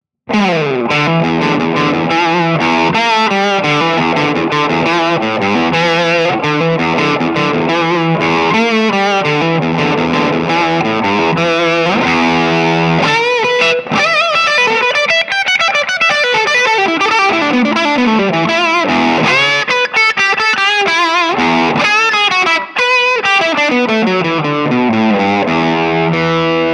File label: characteristic sound; jensentone